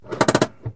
parking brake; Sound FX Real Life

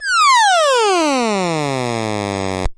falling; panic

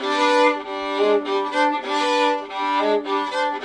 violin loop; ORCHESTRAL and CLASSICAL

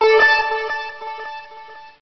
Zorvak Beep

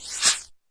ignition hit

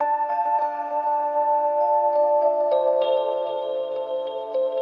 100 christmas rhodes fx; SAMPLED MUSIC LOOPS

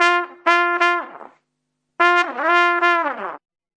acid jazz trumpet; HORNS SAX TRUMPET BRASS

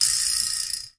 Rattle, percussion; Western and Latin Percussion; single hits

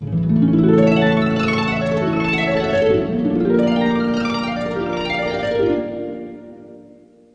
super happy harp flutters; Orchestral

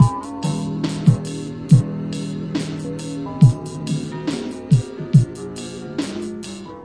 druggy ring mod beat, sampled music loops; MELODIC LOOPS

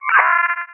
space radio; Scars FNC SFX

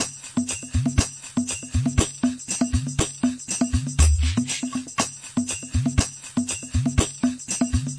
rushed latin percussion, 120 bpm

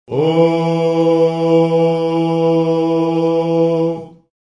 low voices male, Male Oh

